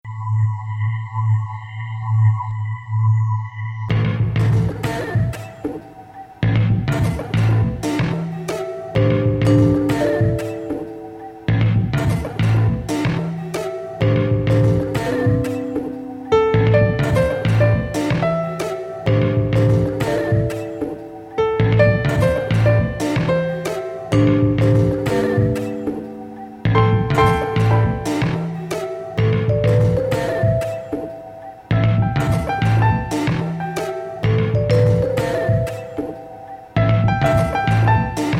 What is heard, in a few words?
Funky FX World